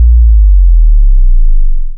Sub

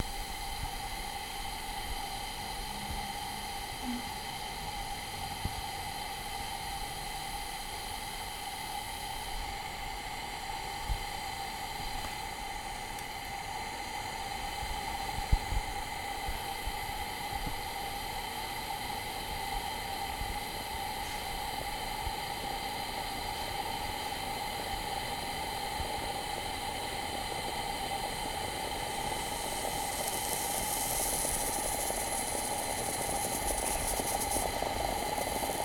Coffee Maker On A Gas Stove

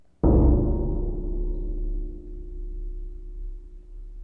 Boing

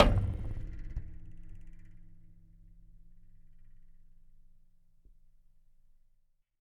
Flick

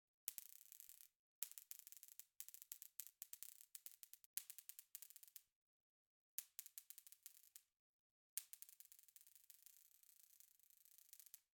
Fly Zapper

